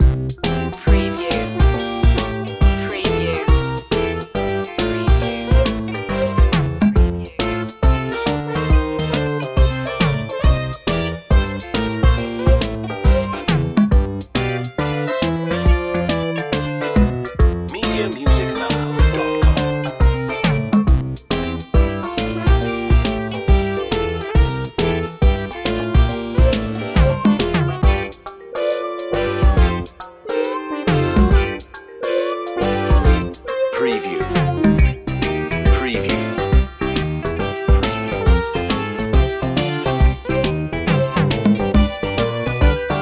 retro video game blues

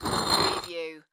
Brick Scrape Drag; sound-effects-bible

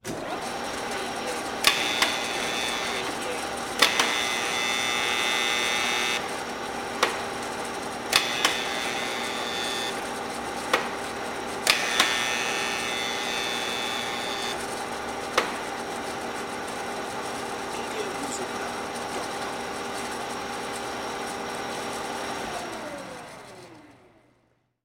Radial Drill

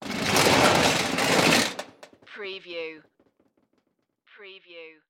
Garbage Can Drag

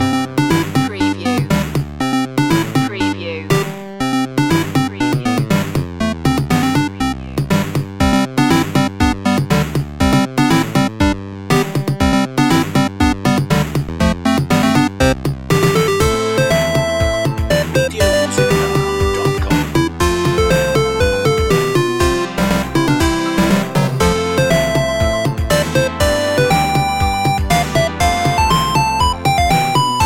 silly gameboy long loop

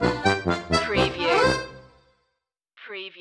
cajun stinger

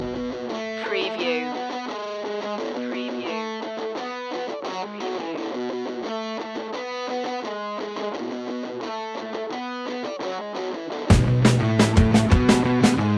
punk pop loop

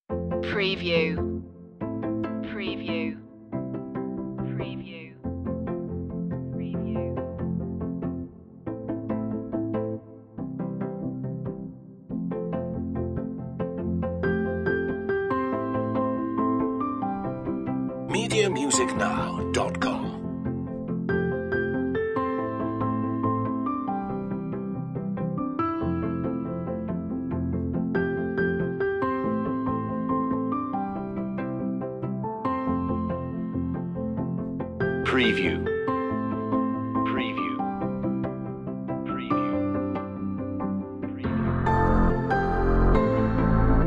no perc

